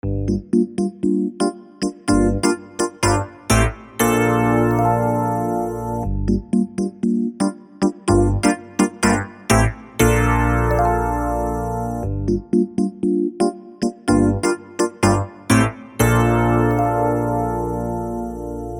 80s ep fm 140 vs pro

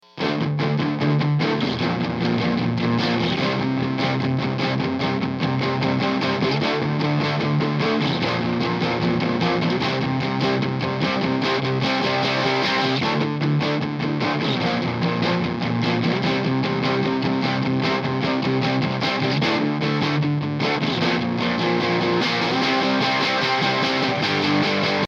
dystortion gtr

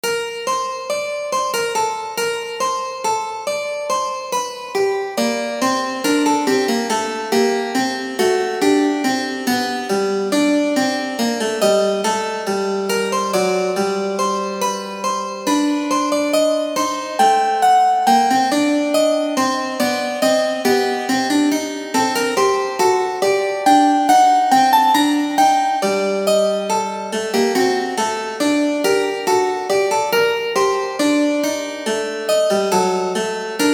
canon stop1 wet

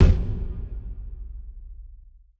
kick; nova sound

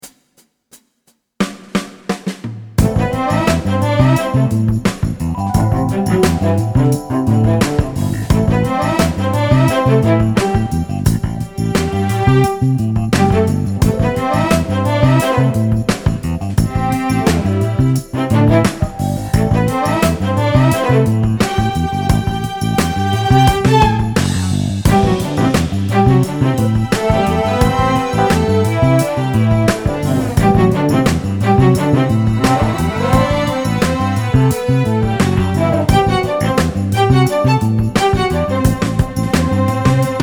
string thing

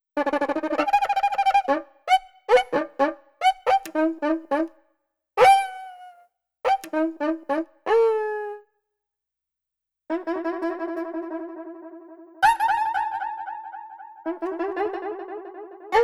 cuca single hits

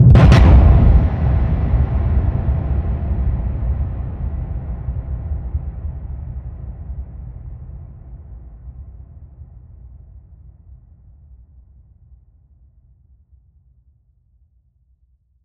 airstrike

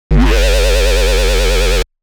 bass

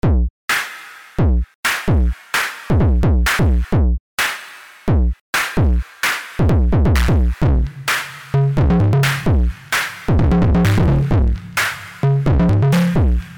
808 drum kit distortion

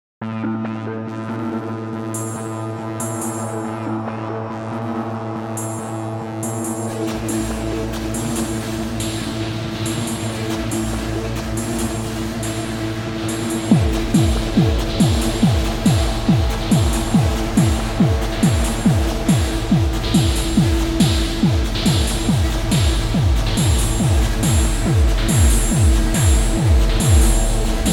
aggressive; filmwig

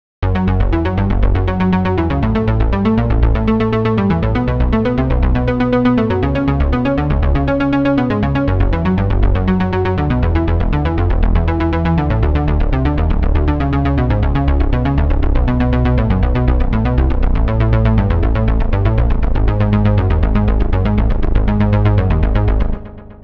seq electronic body

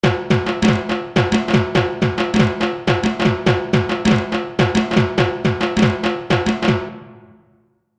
dhaak

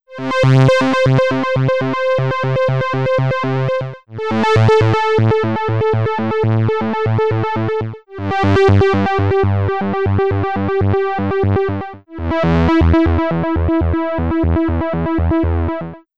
ring modulator

